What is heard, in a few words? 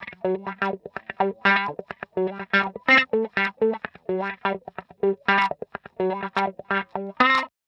125 wah A8, wahwah; PowerFX - Nu Jazz House